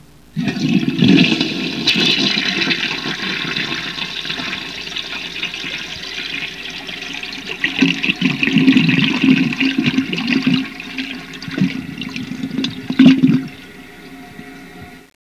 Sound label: TOILET